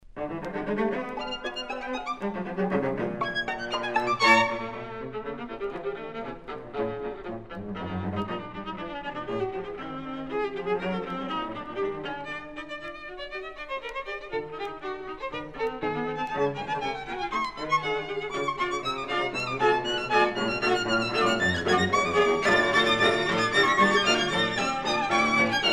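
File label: string quartet; weigl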